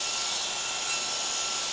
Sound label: white palace saw loop; Hollow Knight